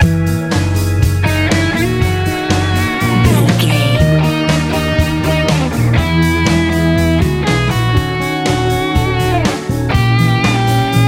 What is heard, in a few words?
easy rock